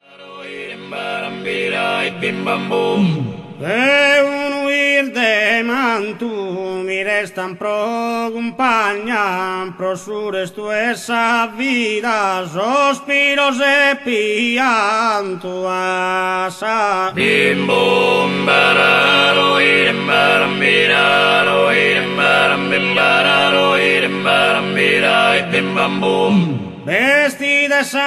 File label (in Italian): Tenores, Muttos; Duos pinnadellos